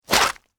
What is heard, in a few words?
Squish Squeeze; Foley; Squishes; BlastWave